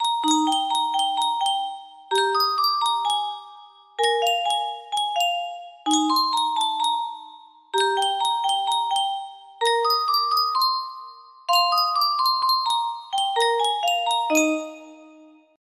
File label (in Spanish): Yunsheng caja de musica, music box melody; patum berga turcs i cavall